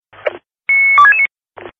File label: Police Radio Beeps